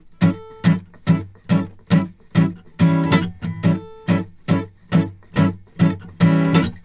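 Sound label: Country Guitar, Verse 1+2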